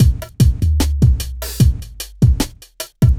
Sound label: hiphop